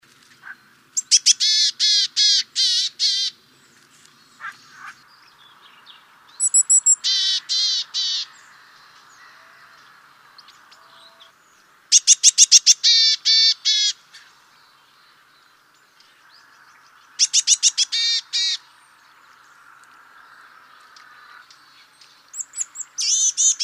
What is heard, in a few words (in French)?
Mesange boreale